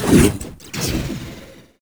GHOST WOLF

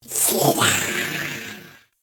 NORMAL ADDER

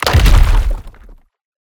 Rockslide impact

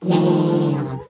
electric firefly

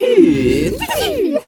FIRE PHOENIX

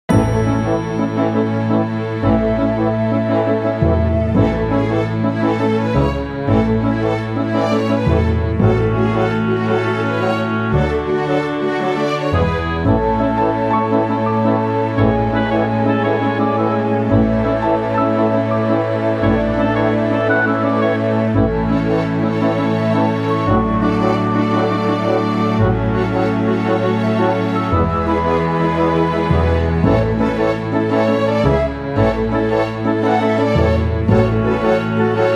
Forest Theme; Woodlow

